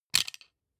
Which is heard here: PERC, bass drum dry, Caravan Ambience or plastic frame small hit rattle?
plastic frame small hit rattle